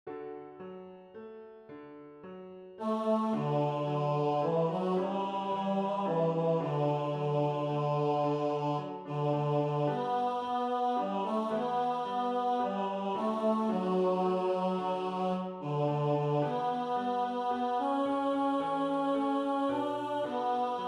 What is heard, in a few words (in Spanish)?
tenor; Cantata para SATB, Solistas y Narración